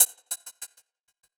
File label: Gated Cymbal; musicradar